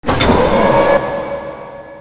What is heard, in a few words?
drown; alienarena; war